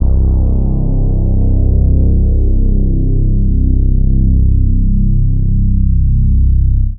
BASS Essential Reese - C, Synth One Shots

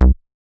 Squarehead Bass C

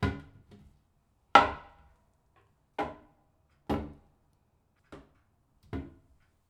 Toilet Lid Up Down

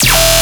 Bass Hit E, Dubstep; Shine Bass; Ghosthack; AC2024 - Day 13 - Dubstep Sounds 2025

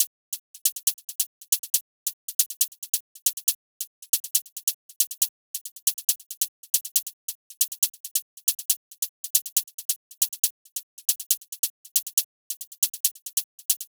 Drill Hihat Loop - 138 BPM; Cymatics; Fiji Hihat Loops & Percussion

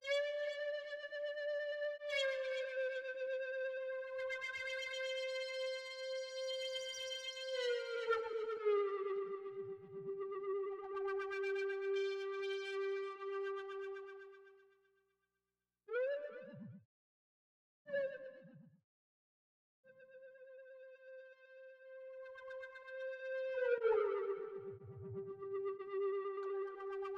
121 BPM G Min Accent Synth, loop stems; Cymatics; 2022 Melody Collection Platinum Expansion - Pt 1; Hibachi

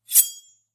Blade Draw; Game Essentials

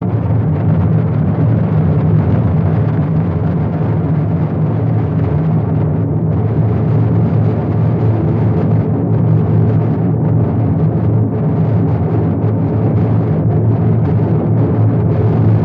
LoFi Field Drum Roll; Vintage Cartoon; Drums & Percussion

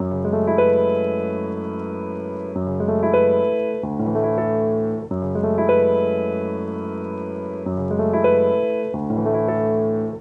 Piano, 94 BPM F# Min; Cymatics; Gems Free Teaser Pack; Cymatics Gems Vol 9

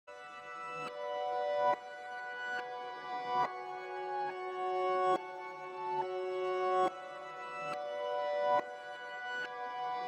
140 BPM F# Min Reversed high; Trap Melody Loops; Loop Stems; Cymatics

